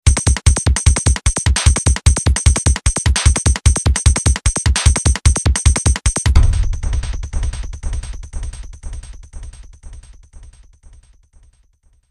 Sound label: jingle